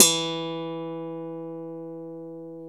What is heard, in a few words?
GTR FNK GTR, Clean E.Gtr, Death Strat; Roland L-CD701; Clean E.Gtr5